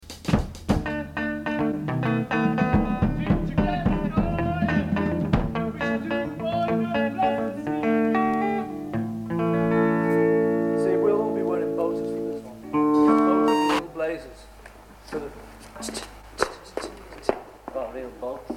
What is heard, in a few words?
Improvisation